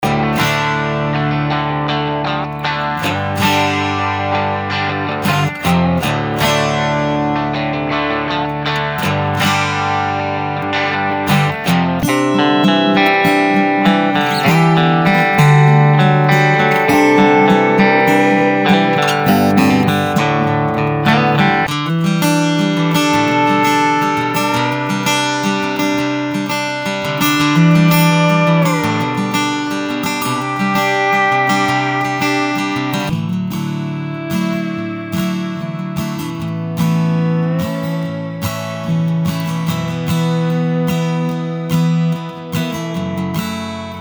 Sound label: Country Blue Guitars